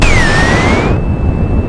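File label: formation, VIDEO GAMES; sound effects and noises; tacscan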